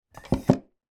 office chair height adjust down with pneumatic lever